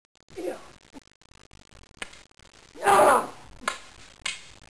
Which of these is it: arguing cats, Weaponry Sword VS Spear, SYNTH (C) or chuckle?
Weaponry Sword VS Spear